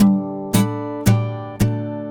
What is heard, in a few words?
Acoustic Guitar; Guitars